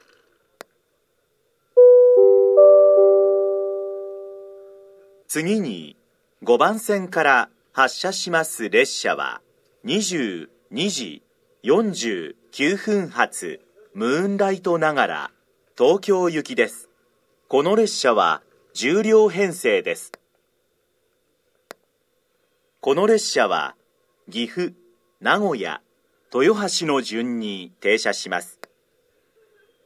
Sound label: rapid; ogaki; tokyo; jr-central; moonlight-nagara